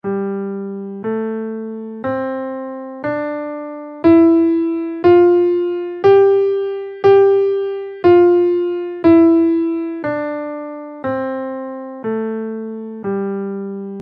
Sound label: dominant sus scale in G